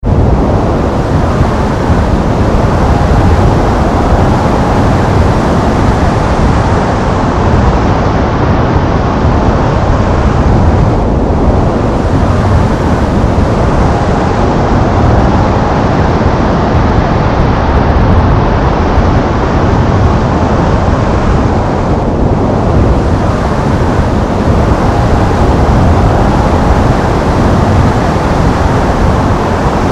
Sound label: wind